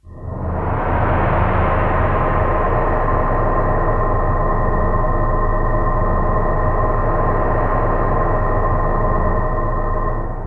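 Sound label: FX right, attacks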